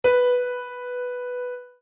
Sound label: instruments piano si; maoudamashii